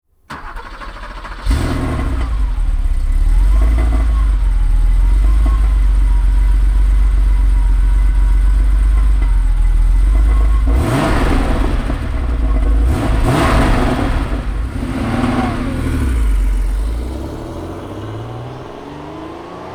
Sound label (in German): motorensounds 911 turbo 3l; 1974